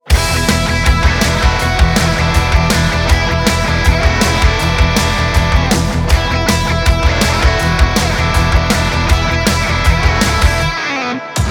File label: 160B